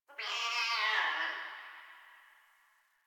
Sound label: Distant Cat Screech; Warframe; Ambience; Nineteen Ninety Nine